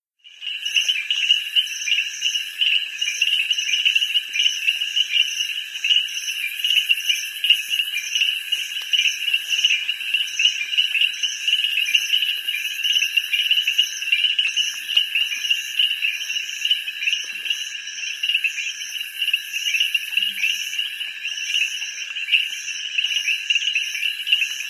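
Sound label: Frogs Distant Peepers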